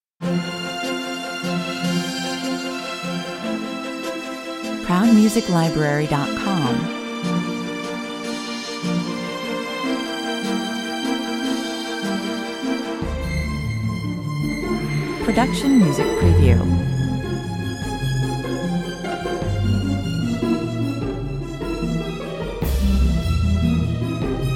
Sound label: glass slipper 104 bpm